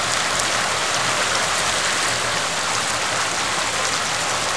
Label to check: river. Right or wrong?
Right